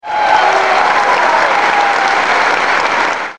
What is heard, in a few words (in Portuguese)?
aplausos; Anos 80